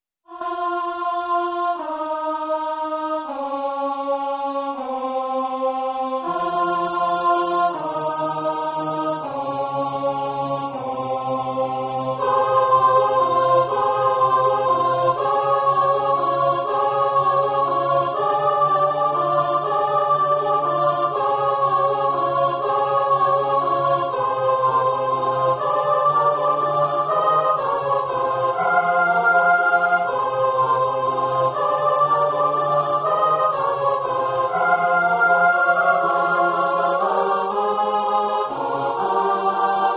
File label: Tenor